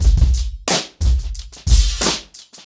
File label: 90BPM, acoustic drums; The Roots Drumloops